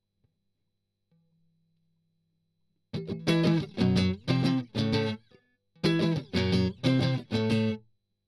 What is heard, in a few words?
Strum Octav